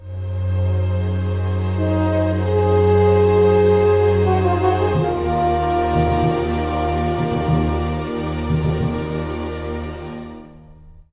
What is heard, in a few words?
success objective; Hidden Dangerous 2